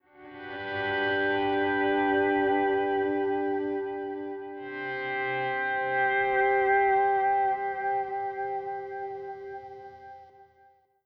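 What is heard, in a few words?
Country Guitar Breakdown; Digidesign; Pro Tools Essential Loops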